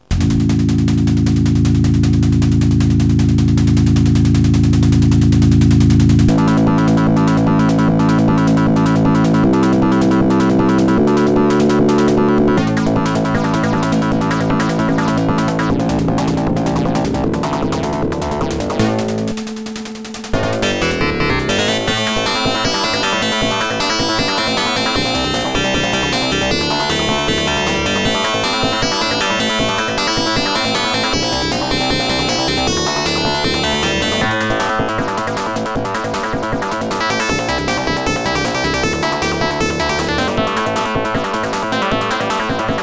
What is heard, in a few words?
cmi 8738, fm; FM-синтеза; звуковых карт; MS-DOS; C-Media CMI8738/PCI-SX